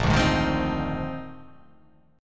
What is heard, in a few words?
A sharp 11th